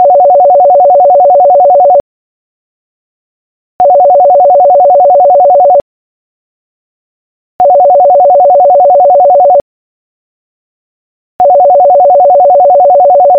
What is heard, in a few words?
Landline Phone Calling